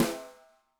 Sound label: Snare, Roland TD-20